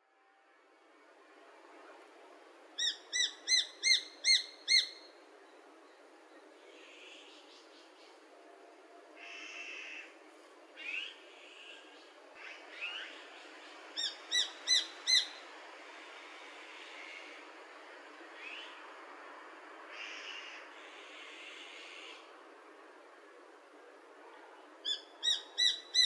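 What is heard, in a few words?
tumi, Japanese sparrowhawk; saitama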